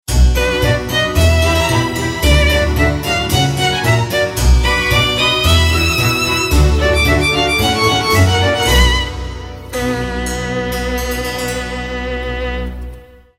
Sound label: Chamber Ensemble